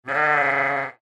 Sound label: sheep bah